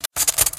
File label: spark; source engine; portal2; train